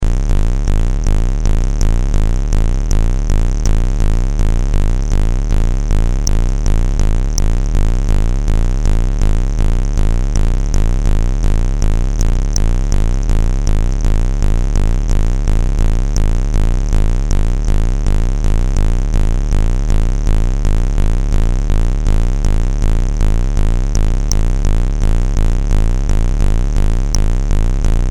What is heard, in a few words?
Noise